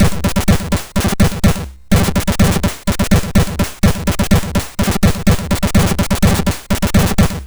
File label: Supremacy Jingles; MOS6581R3